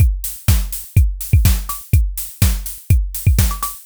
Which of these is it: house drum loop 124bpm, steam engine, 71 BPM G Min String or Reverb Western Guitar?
house drum loop 124bpm